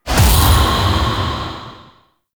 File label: Void Tower Capture Disrupted